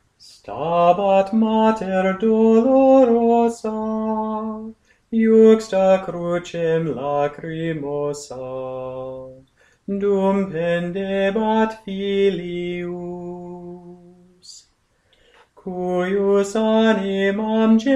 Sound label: Stabat Mater Latin, chant